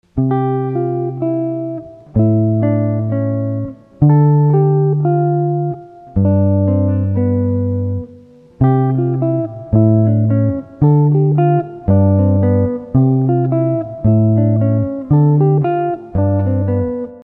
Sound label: S Guitar